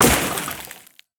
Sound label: trash destructible